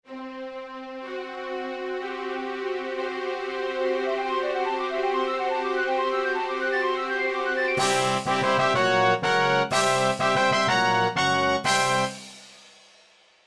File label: boss door opening; nintendo 64; sequenced; donkey kong 64